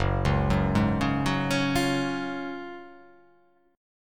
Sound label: F sharp Major 7th Suspended 2nd, F sharp, down